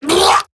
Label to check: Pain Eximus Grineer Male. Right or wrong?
Right